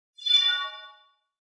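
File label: HF Electronic Whistle Wash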